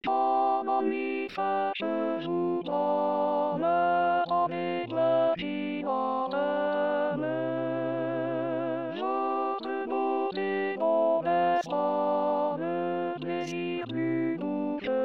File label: alto; rimailleurs